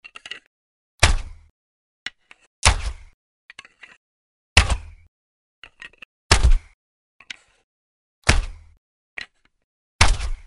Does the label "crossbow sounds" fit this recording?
Yes